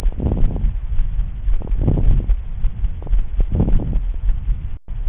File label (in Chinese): 肺部听诊 水泡音